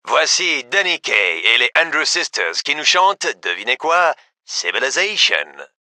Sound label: music